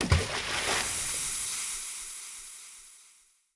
Acid Splash

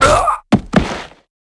Magi M Death

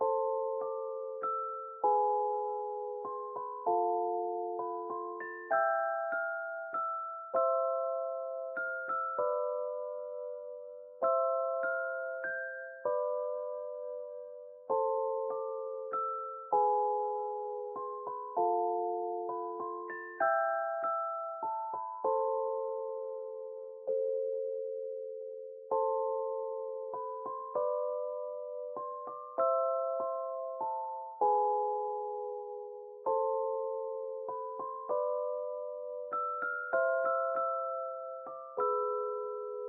music box loop

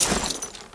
Skell Soldier Cloth

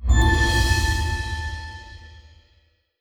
hint recharged; Mystery Legends: Beauty and the Beast; iOS, Windows

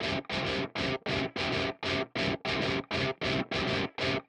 music (Factory Boss Intro); unsighted; Factory Boss Ph2 Intro

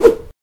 Wall Jumping

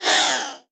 Damage; Folk Hero

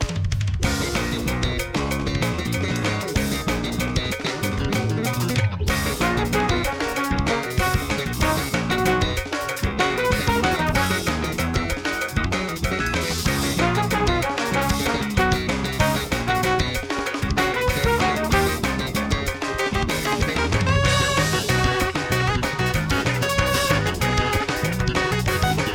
Subway (Final Lap, Underground); Super Bell Subway

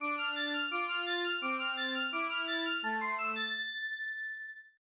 Station Jingles; Physicist

